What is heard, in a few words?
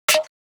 sugar clap